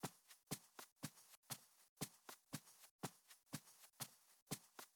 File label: Run Wood loop